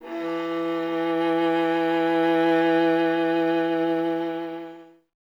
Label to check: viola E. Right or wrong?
Right